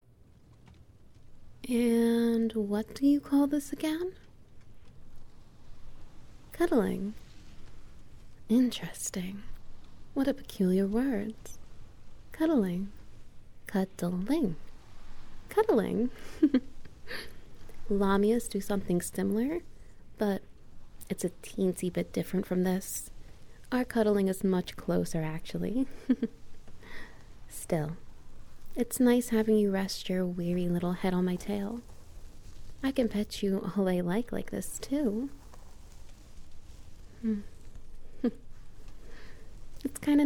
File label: Ambience Heartbeat